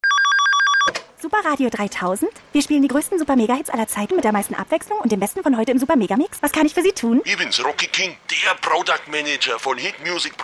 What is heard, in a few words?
jingle; plattenfirma